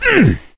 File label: melee swing, gambler, survivor voice; source engine; left 4 dead 2